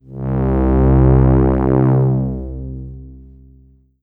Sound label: Yamaha TX81Z LowString C2